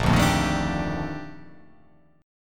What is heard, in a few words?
A sharp Major 13th A sharp